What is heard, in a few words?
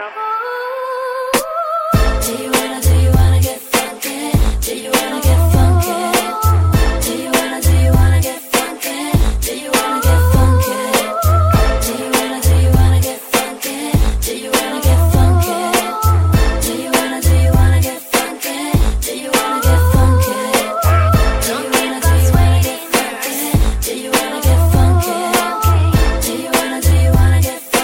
Sound Ringing Tone